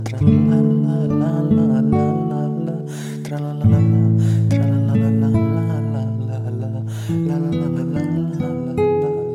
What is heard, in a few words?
tralalalalala